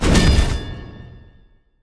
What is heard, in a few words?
ironflesh fire; alteration